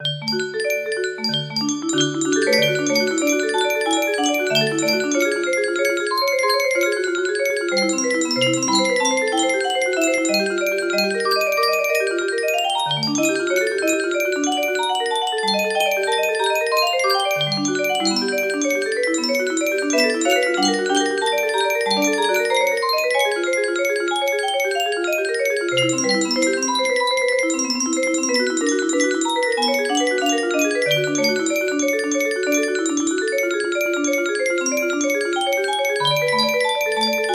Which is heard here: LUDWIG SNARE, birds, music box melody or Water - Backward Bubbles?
music box melody